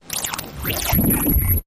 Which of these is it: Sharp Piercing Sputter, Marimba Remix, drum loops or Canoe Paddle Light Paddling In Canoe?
Sharp Piercing Sputter